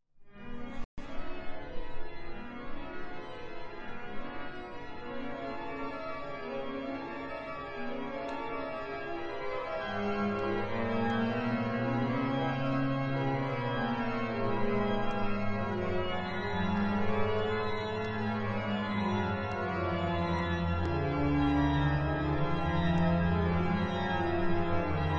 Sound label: Toccata; Moreau